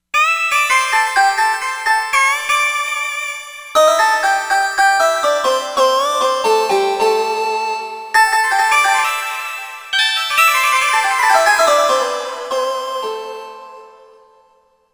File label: ethno lead, Korg; Korg MSC-13S Ethnic 2